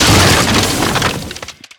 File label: Shatter Ice Block; Destruction